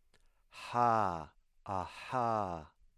voiceless pharyngeal fricative

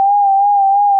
800 warble

